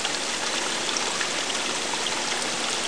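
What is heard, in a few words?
background music; Virtual Graceland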